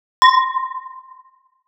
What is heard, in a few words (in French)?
verre